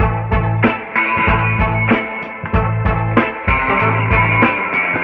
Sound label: horns and guitar, phonky loops